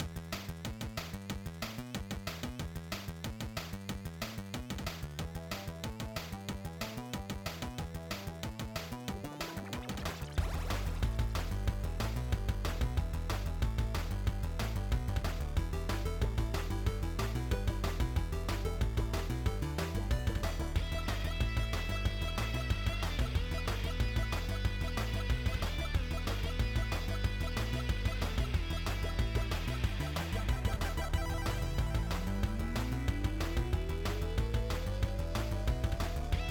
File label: music; Ben 10 - World Rescue